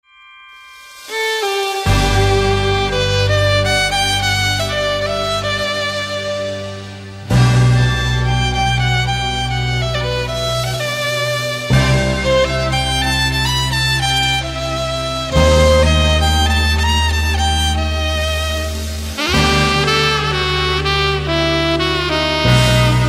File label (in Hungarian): sax hegedu; adventi